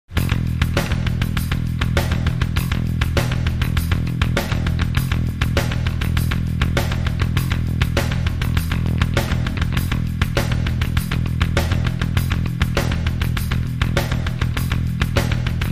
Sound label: drums bass